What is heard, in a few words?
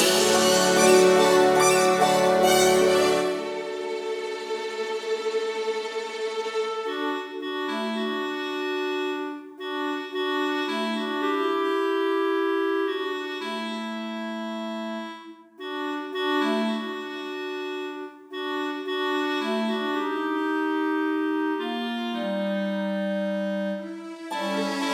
the sound of music accompaniment